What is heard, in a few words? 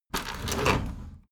window roller shutter open soft single pull